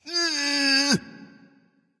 tongue; smite; poseidon

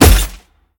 hit; Smite; god Lancelot

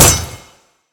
ability hit; smite; god arthur

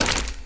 movement run; smite; Arachne

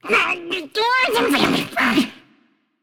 grumble; cupid

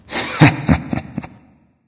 "heh heh"; smite; voicepack; ullr

